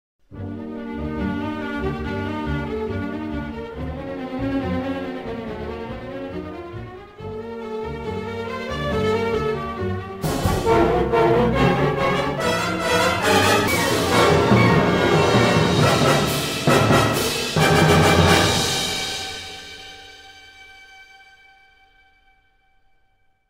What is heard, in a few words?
Stinger Game Warning